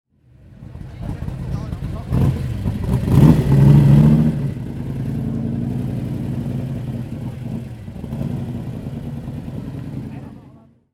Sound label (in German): Soundeindruecke American; Amerikanische Klassiker